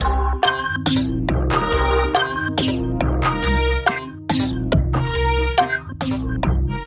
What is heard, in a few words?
Dark Ride, Synchro Funk, Beats; Sonic Foundry (Sony Creative Software)